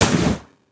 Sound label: gun